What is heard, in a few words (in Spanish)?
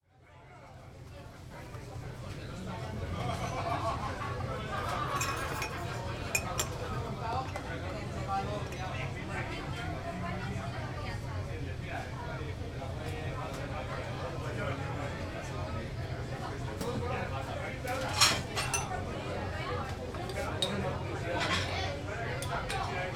preparando el pintxo ganador; bilbao; gure toki; soundfood